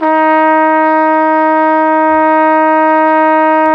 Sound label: Flugelhorn; Roland L-CDX-03 Disk 2